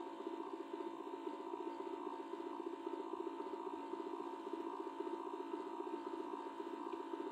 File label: Music Box-Foley (Drive-Loop)